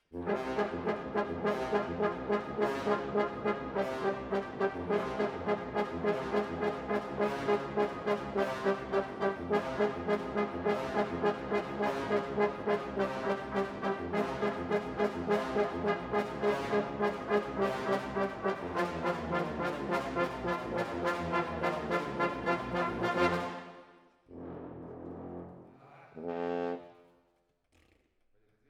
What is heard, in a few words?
Horns Over